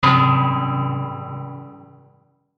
Metallic Tank Hit Dark Reverb; Metallic Sound; Blastwave

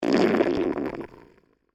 Stomach Gurgle; Miscellaneous Human (Sound Effects:Human); BlastWave

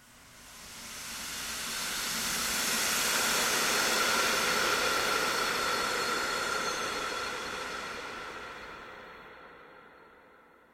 Swell Airy Toxin Steam Release Long; Sound Design; Swells

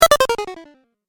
8 Bit Style Fall

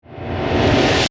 Reverse Whoosh; Production, Element, Riser, Metallic, Ring, Airy, Dark, Abrupt; Ascending

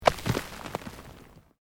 ROCK TUMBLING

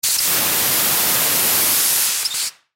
Fire Air Tank, Fire Air Tank Release Air; Emergency; Flame Sound Effects

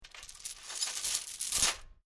Medium Chain Drop To Floor; Metal Sound Effects; Chain sounds; Lynne Music

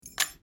Keys Dropped On Table; Small Ring Of Keys Dropped On Wooden Table; Miscellaneous Household

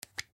Cologne Cap Off, Cologne Remove Cap; Primping; Human; Household; Blastwave

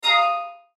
Chime High Tech; Chimes; Multimedia; Blastwave

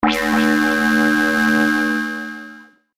Ray Beam Burst, Scifi; Science, Fiction; Battle; BlastWave

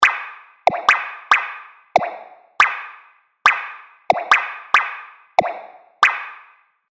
Drippy Kind Of Loop 140 Bpm, sci fi sound effects; Looping Sound Effect; Fantasy, Horror And Sci-Fi Games

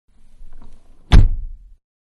Mid Size Car Door Close Interior; Cars (Sound Effects:Vehicles); Manhattan Production Music

